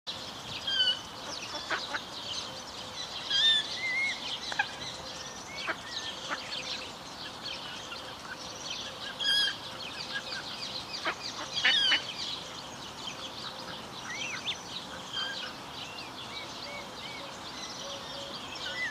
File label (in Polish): kokoszka, gallinula chloropus; jose carlos sires; parque alamillo